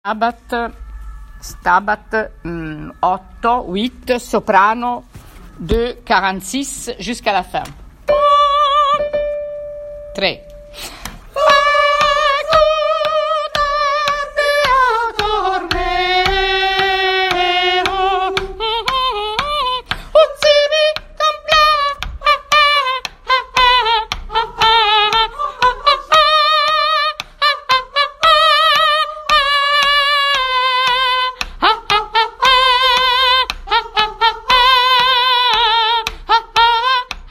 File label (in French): soprano rapide; Mesures 46 à final